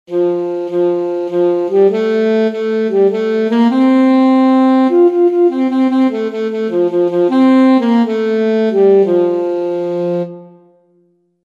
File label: alto saxophone; row your boat